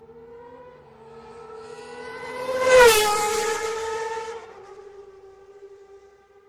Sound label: Ferrari Sms